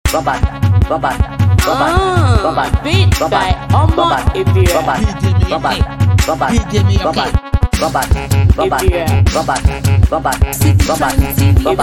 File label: Beat